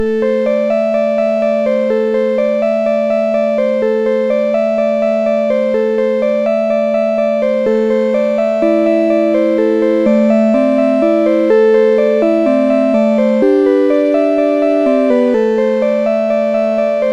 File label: soft organ flourish; MusicLine